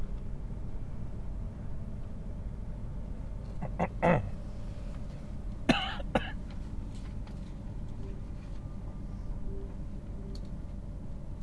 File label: train test